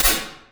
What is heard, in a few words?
hat